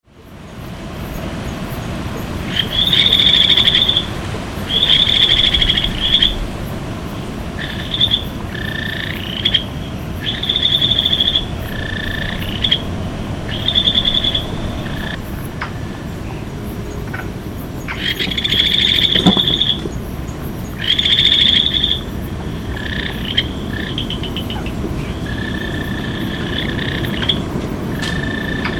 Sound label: yaeyamakajikagaeru call, frog